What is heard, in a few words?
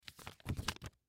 Envelope Open; Paper; Office; Blastwave